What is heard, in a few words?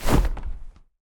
wing flap, manticore; smite